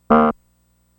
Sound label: Baritone Horn Honk Go, horn